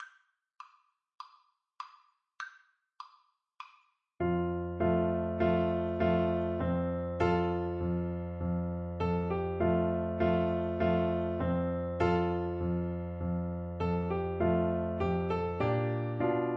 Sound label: spring, Trumpet